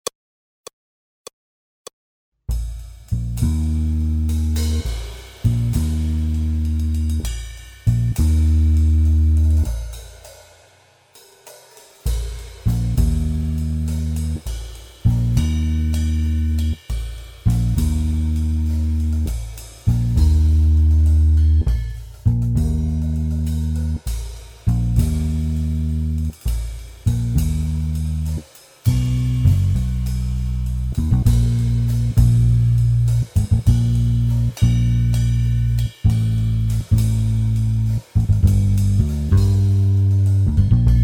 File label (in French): Accompagnement seul